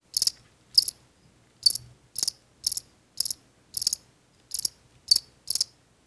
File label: bug cricket loop